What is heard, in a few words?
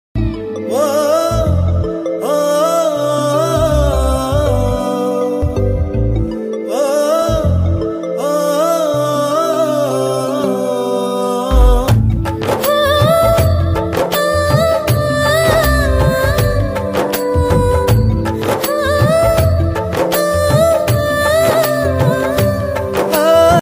romantic song ringtone; lovely couples ringtone; aandipatti